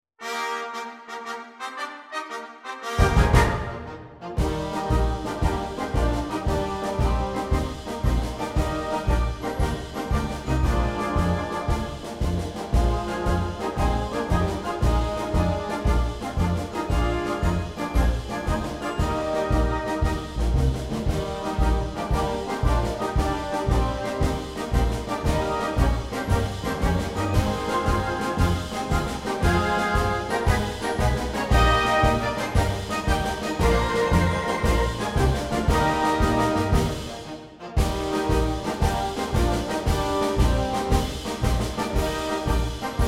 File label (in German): Marsch; Marsch der Nortorfer Vogelgilde von 1612